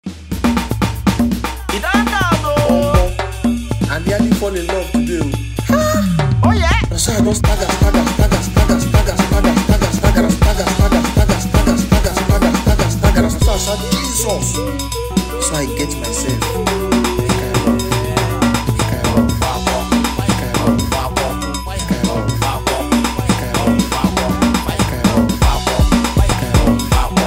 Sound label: Cruise Meme Beat